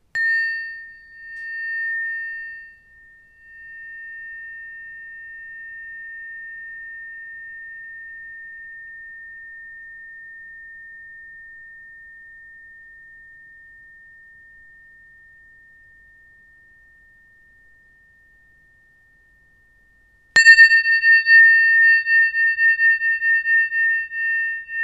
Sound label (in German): TRIGON 15 siderischer Mond